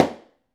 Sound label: Gondangdua; Club-50 - Foundations Roland; Exotic Drms